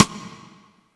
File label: Side Stick, Yamaha PSR E305